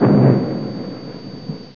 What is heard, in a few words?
tank gun